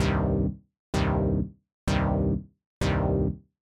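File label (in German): subtraktive synthese filter-hüllkurve; bitwig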